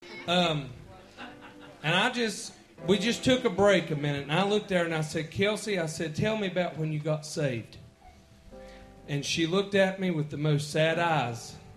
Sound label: choir